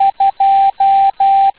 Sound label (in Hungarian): Távíró 2